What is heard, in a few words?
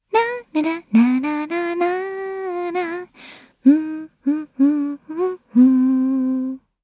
Vox Hum, jp; voices heroes jp; Aisha; kingsraid